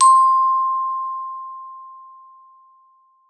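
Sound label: GLOF C4, glockenspiel; Xsample VOL-4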